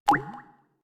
dewdrop unlock, LG phone ui

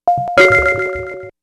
Alert; Samsung; SGH-i300

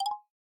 drumset, drums; SKY IM-A800S-VEGA-LTE; keytone

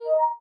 Success new; Microsoft; Windows Phone; Hey Cortana

